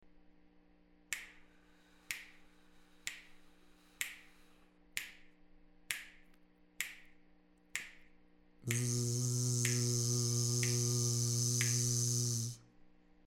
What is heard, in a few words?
Square Z Hiss